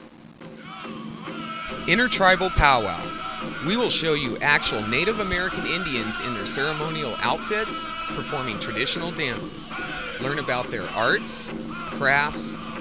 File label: pow-wow